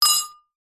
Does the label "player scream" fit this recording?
No